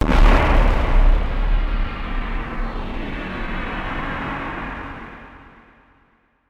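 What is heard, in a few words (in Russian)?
взрыв бомбы с эффектом отдаления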